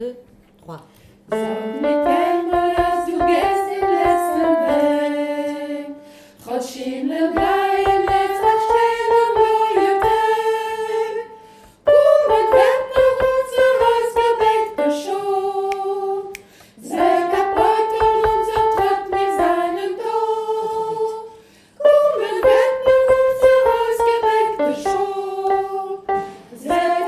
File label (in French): soprane